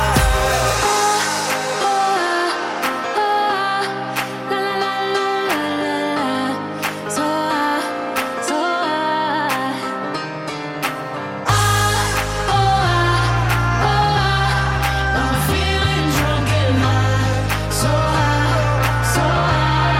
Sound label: Hynm Ringtone